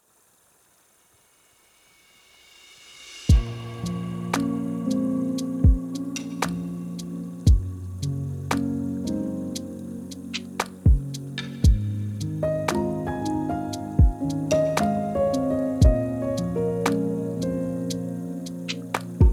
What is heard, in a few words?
lofi hip hop